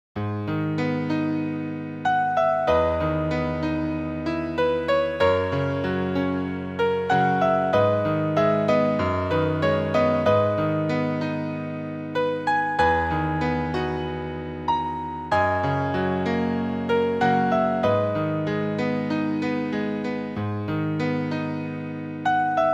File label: piano